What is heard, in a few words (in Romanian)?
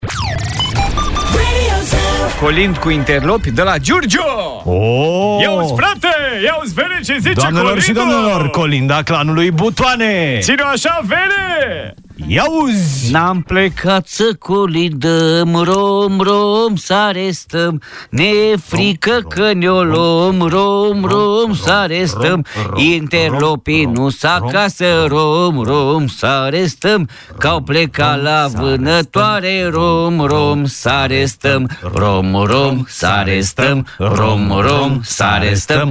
Colind judetul Giurgiu; colindele pe județe